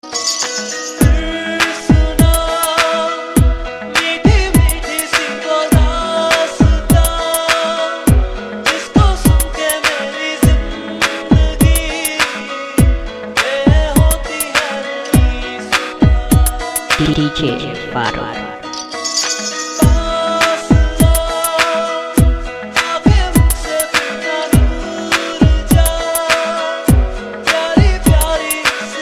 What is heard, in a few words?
Remix Ringtone